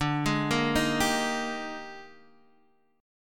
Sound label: A sharp 13th